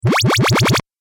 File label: Game App Recharge; video game